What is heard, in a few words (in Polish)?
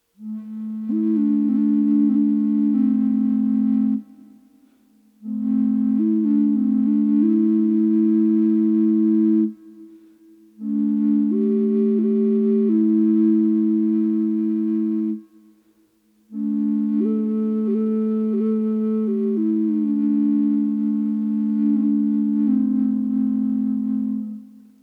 kontrabas 432, flet native american z dronem a contrabas 432 hz